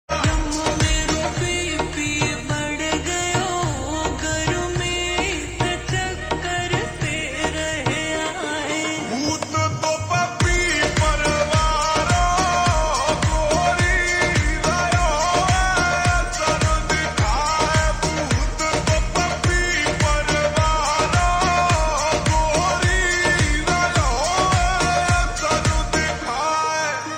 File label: Slow Reverb Version Ringtone